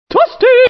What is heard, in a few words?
woopsy